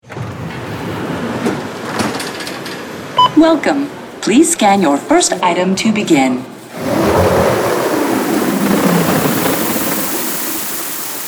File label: Retail Sounds; Field and Foley